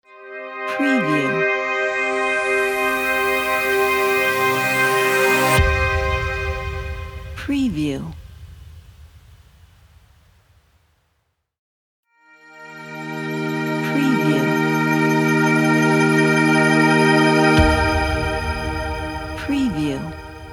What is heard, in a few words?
soft elegant logo fx